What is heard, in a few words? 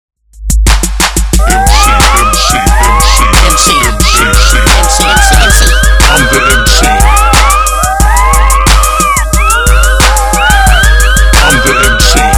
instrumental